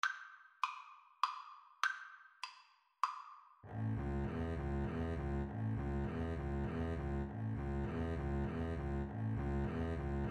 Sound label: Double Bass Duet